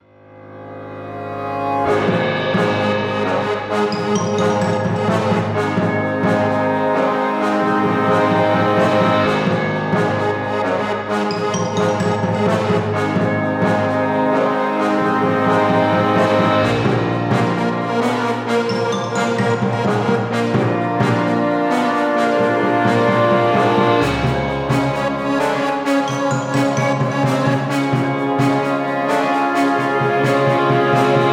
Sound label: Background Combat; Phlyndir